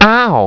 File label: OUGH; PEOPLE